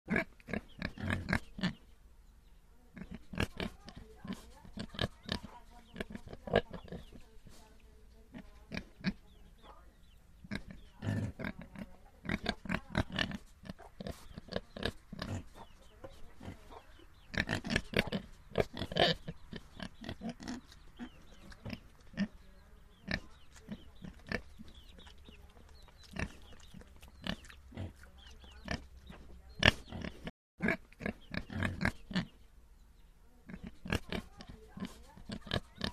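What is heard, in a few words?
pig piglet surround 3D sound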